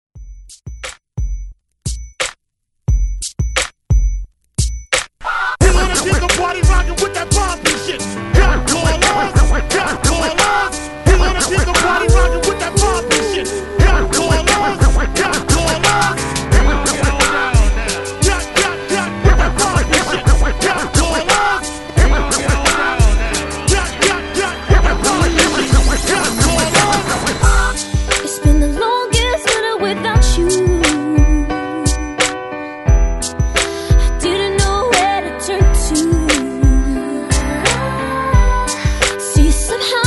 Hype Scratch Re Drum 88Bpm

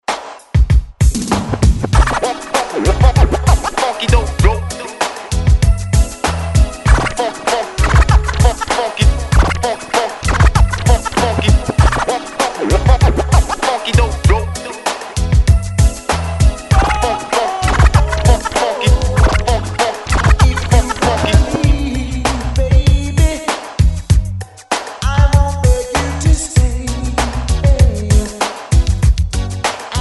80s Scratch Re Drum 97 Bpm; DJDOLLS

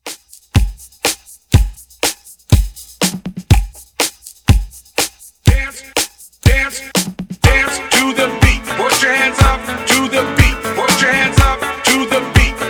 Hype Intro Redrum 122 Bpm

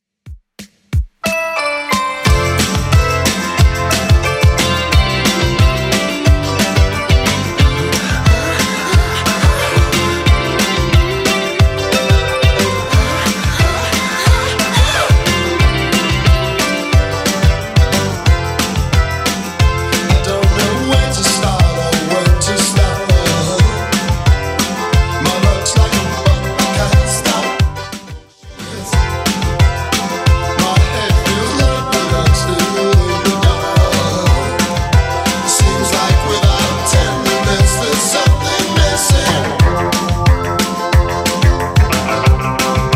80s DJ Friendly Redrum 180bpm

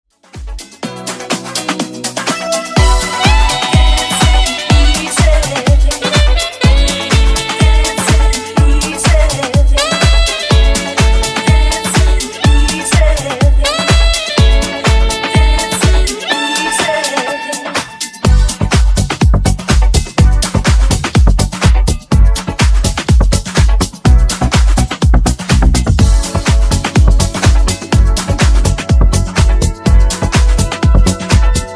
Funk Retro Disco Edit 124Bpm

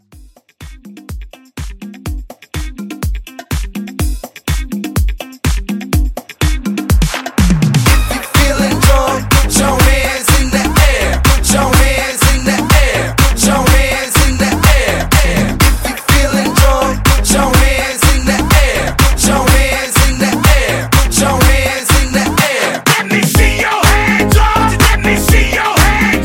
Club Disco 124

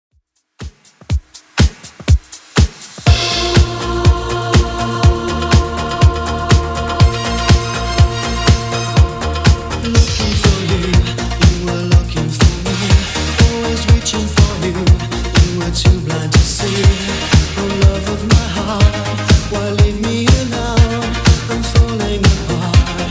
80s Redrum 122bpm

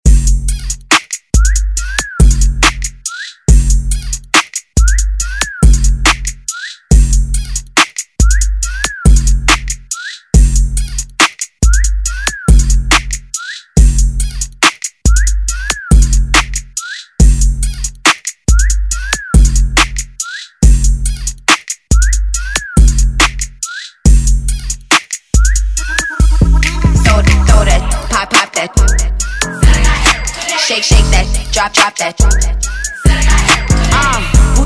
hiphop redrum

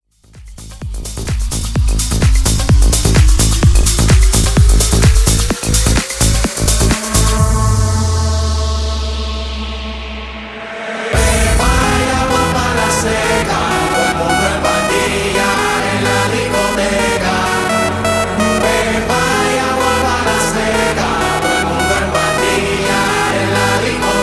Mixshow 128Bpm

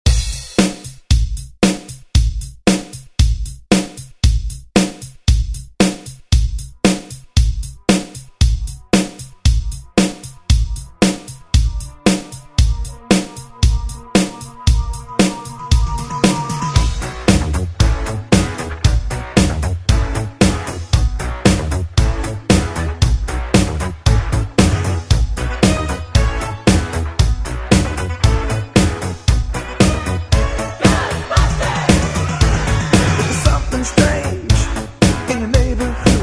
Pop Redrum

